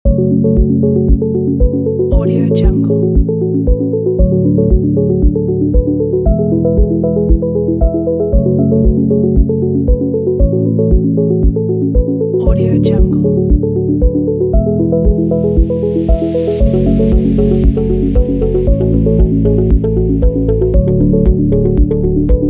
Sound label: ambient